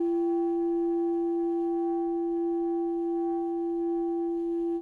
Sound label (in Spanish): Multiarmonicos